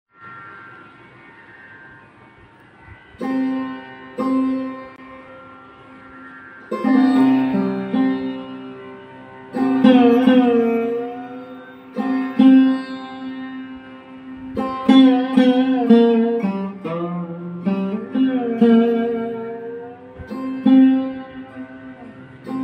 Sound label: sarod